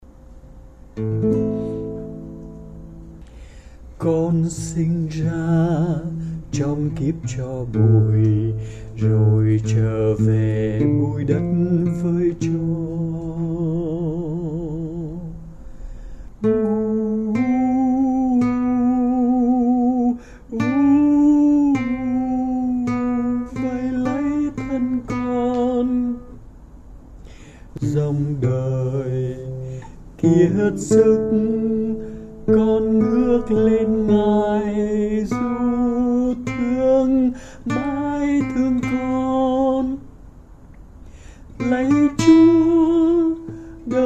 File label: Tenor